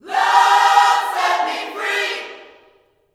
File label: LUV FREE, Vocal Gospel; Vocal Planet Vol1 Gospel